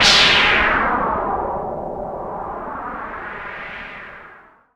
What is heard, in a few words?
BassStation